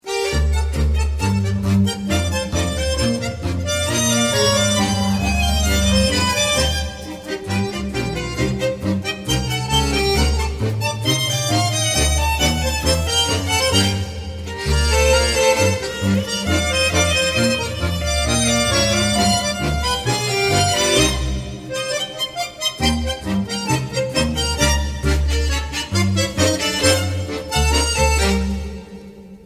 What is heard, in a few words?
tango